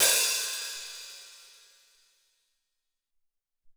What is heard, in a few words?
Verb Hat, Alesis SR-18